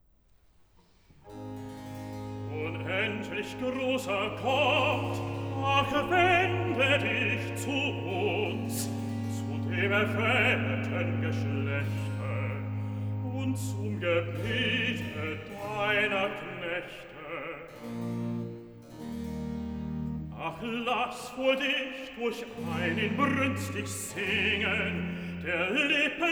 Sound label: Cantata, Recitativo; BWV 194 'Höchsterwünschtes Freudenfest'; 'Unendlich großer Gott'; Bach; Kirkwall; Trinity Sun